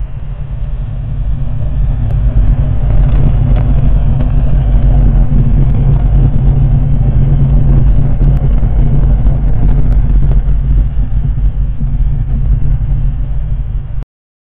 fire crackling screams; magic

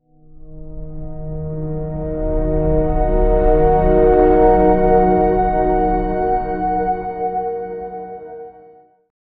cold melancholic scor; 10s

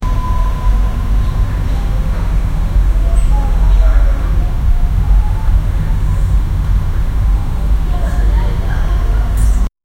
background sound of an, classroom

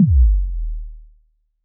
short synthetic drop, synthetic

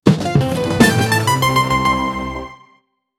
the ending to a comedy

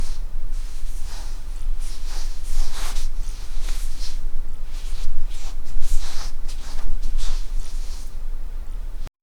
rustling of wool quiet

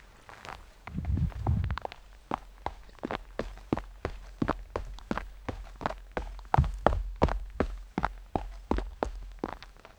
slow walk leather boots, open field

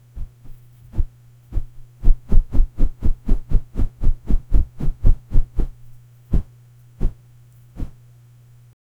bed mattress shaking rapi

